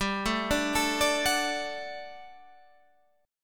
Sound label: G Major 7th Suspended 2nd G down Guitar Half Step Down